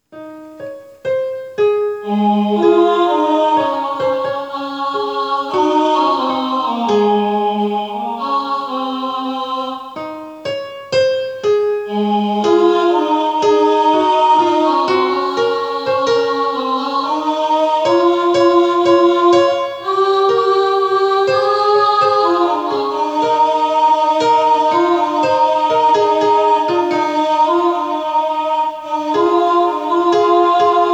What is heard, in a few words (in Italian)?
tenori